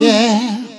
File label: "yeah" SUNG